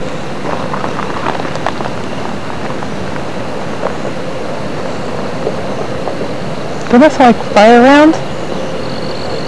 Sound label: fire rounds